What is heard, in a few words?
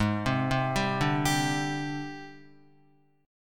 G sharp Major 7th Flat 5th Guitar; 3,3,0,0,2,3; JGuitar